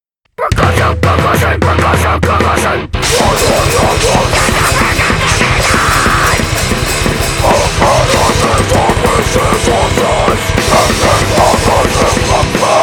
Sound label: Percussion; Concussion